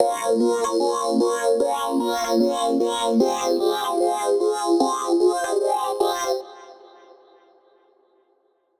Dubstep; Hybrid Standard; midi wizard

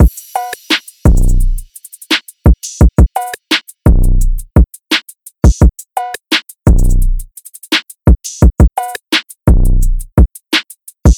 171 BPM Bm

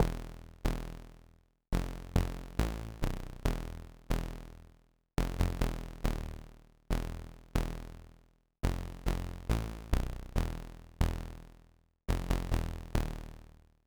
Bass 808s Dirty Before

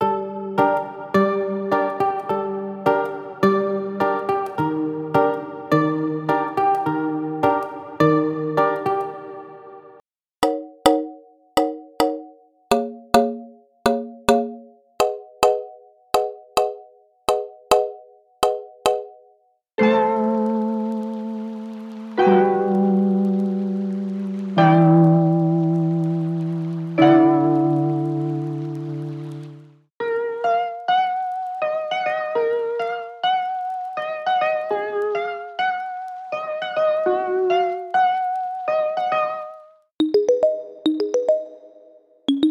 Reggaeton

